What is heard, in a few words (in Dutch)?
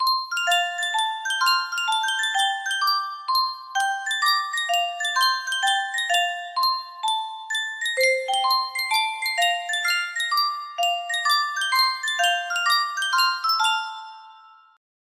yunsheng muziekdoosje, music box melody